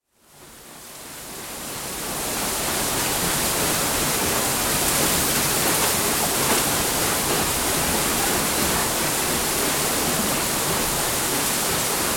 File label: Water Mill, exterior, water wheel turning; Machinery; BBC